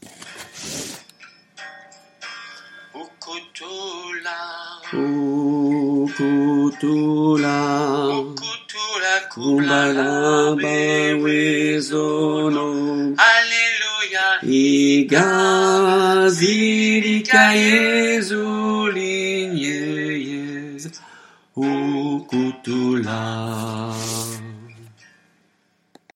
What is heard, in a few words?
alto, chorale; Ukuthula